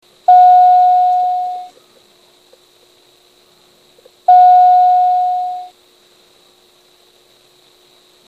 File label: door; seibu101a